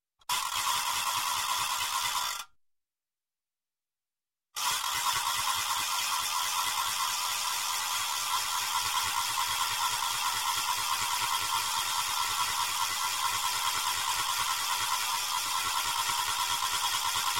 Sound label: Noisemaker, Spinner metallic Spinner, Party; Sound Ideas 2000 Series